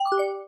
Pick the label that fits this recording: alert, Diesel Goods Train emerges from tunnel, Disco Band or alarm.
alert